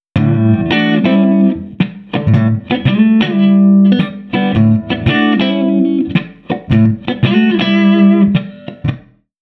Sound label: guitar vox; audio ease speakerphone